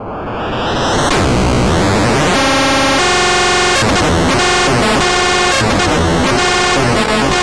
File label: transport begin; space kitty